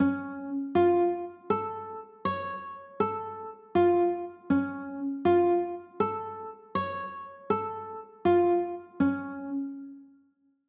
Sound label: c sharp aug, chords